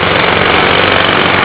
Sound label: tank